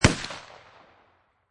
Pistol Rhuger 44 Magnum Single Shot Exterior At 45'; Gun; at 45 feet distance; Pistol Sound Effects